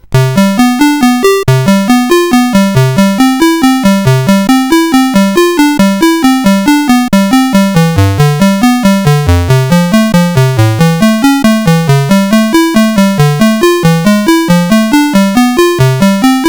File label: Police Cadet, CSG8580R5